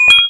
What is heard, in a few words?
beep